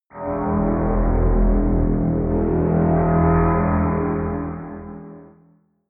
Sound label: roaring drone